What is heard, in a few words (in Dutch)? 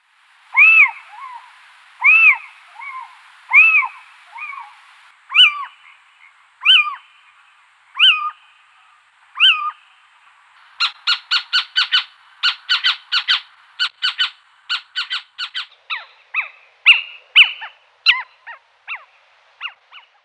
Steenuil